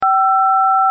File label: keypad press5